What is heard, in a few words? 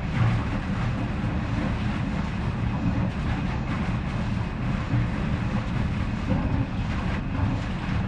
swampland pipe; Titanfall 2